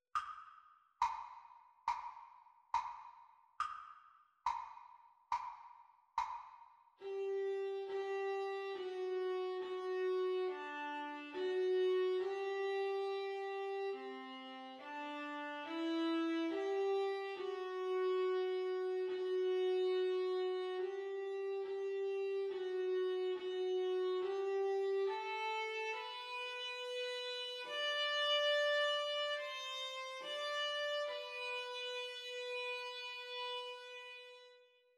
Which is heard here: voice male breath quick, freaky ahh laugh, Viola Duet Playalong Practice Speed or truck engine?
Viola Duet Playalong Practice Speed